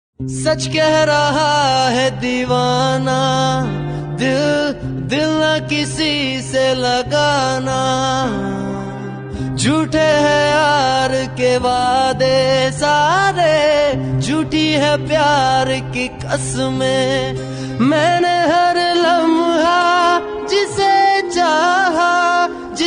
Old Hindi Song Ringtone

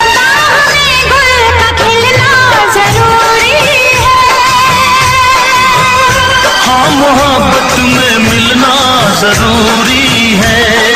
Old Song Ringtone

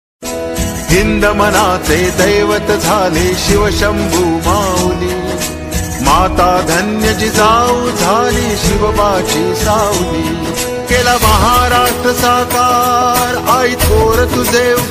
Marathi Ringtone

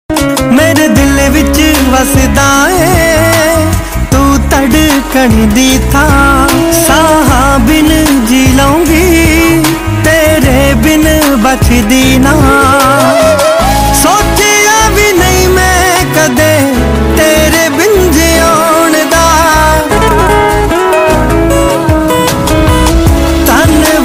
Punjabi Ringtone

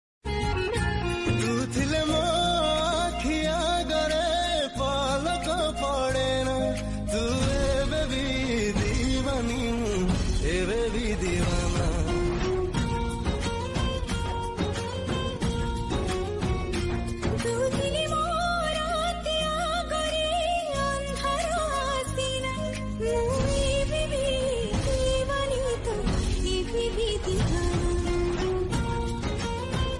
Odia Song Ringtone

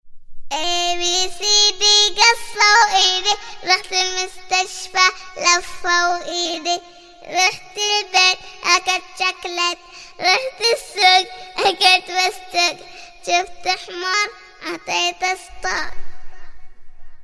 New Arabic Ringtone

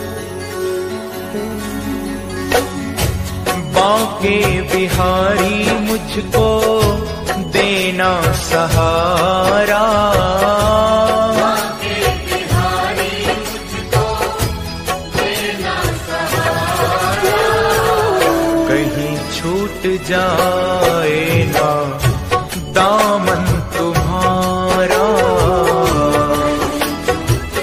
Bhajan Ringtone

